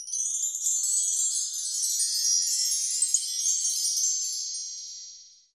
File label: bells, percussion